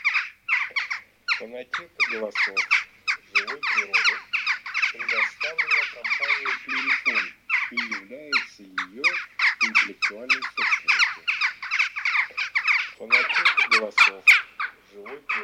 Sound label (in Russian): Галка Taccola